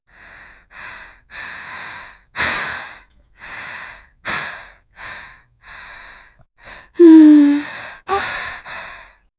sound of a teenage girl, mouth closed

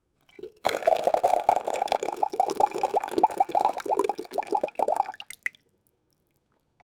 slurping water with straw; food and drink

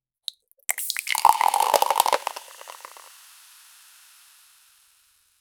Beverage

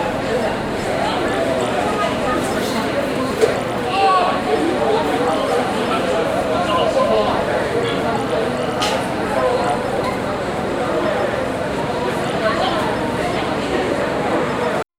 busy station crowd; environment

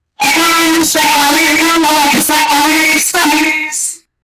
alarms blaring

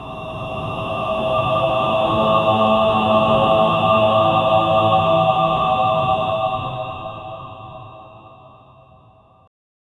ultra realistic shamanic, occult

